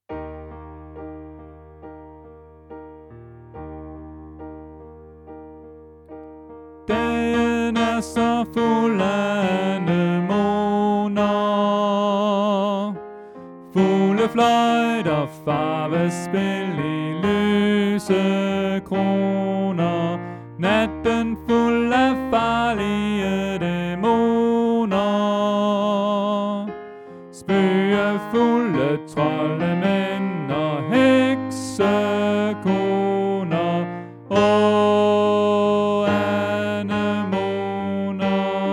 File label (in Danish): Tenor